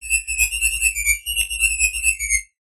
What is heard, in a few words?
strange robotic chirps; sound design; zapsplat